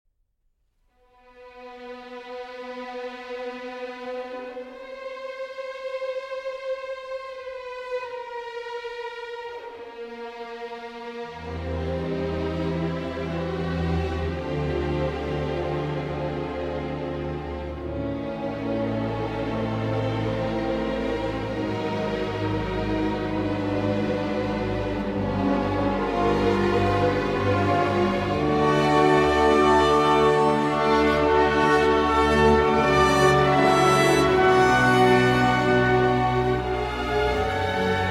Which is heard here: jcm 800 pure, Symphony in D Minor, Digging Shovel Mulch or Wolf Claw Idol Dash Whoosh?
Symphony in D Minor